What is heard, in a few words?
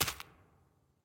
footsteps nest; Fate The Cursed King